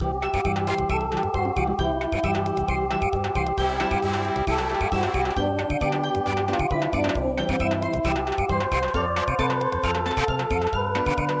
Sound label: Samba, midi; Head Shoulders Knees Toes